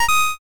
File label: judge good